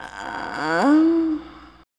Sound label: voc